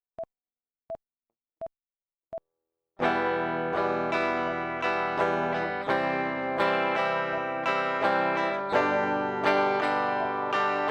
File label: Strumming Pattern, guitar